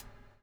BUBBLE; Spectrasonics - Backbeat

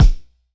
Pound Hard N Heavy, Kicks; Airwindows

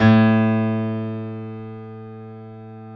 PF, B GRAND PF

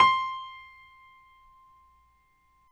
PNO XYPNO, Grand Pno, Roland

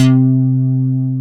Dynamic Bass; Best Service Gigapack 2

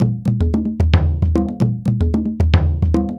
African Drum 75 bpm; Quazibeat; African Secret; African Drums

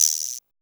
CR78 GUIRO; R+B KITS2

